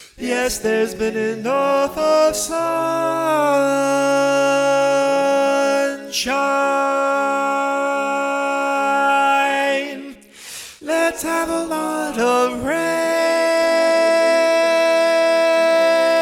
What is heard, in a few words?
Rain Medley, Lead, Barbershop